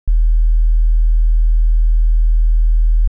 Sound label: SIN40R